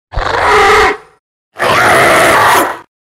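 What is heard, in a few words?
werewolf roar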